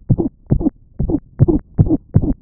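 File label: Dog Mild mitral regurgitation Musical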